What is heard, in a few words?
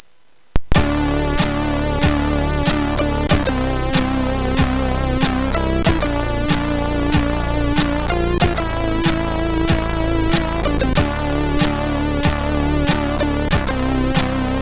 sid, MOS6581R4